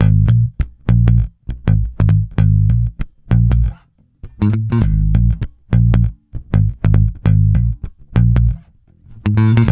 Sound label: A#, mute, bass; Spectrasonics; Bass Legends; DEF MUTE